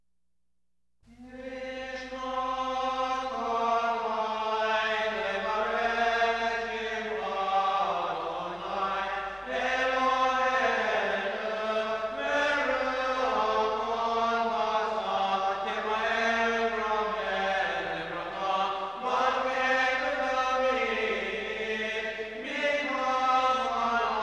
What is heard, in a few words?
Nishmat, Sephardi; Shabbat